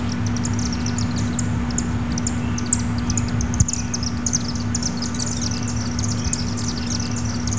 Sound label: chimney; birds; usgs